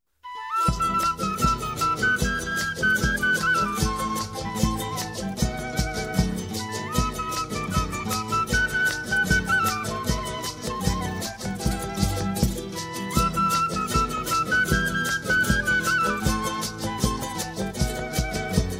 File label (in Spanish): musica pop rock